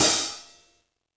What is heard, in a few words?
hihat - opened; JazzFunkKit